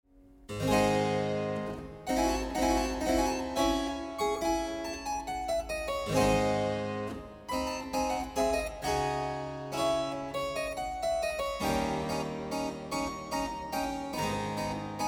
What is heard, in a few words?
sonata; burney